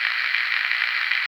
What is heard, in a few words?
noize